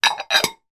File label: household 2 china bowls stacked